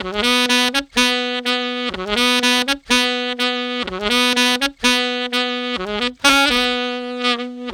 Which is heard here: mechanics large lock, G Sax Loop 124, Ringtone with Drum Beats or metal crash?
G Sax Loop 124